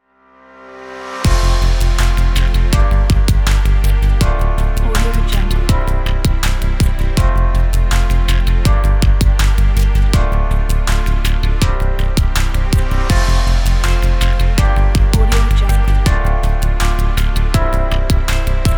tech inspiring

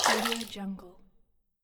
small water splash

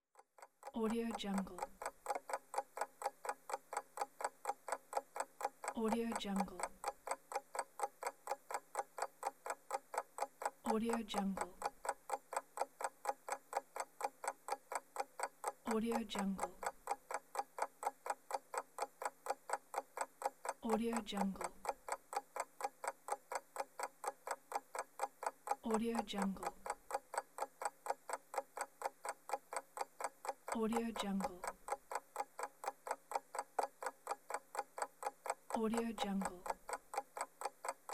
clock

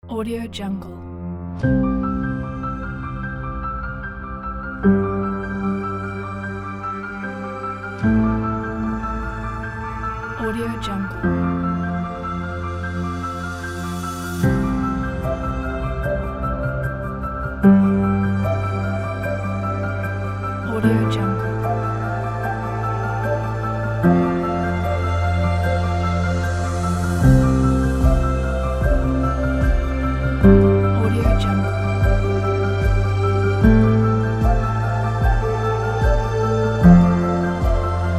beautiful piano

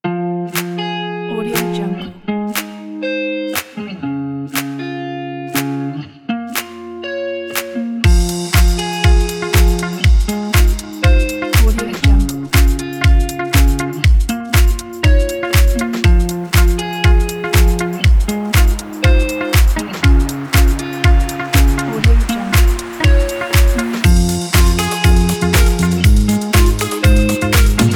pop advertising

